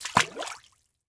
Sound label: Liquid Water Water Foot Splash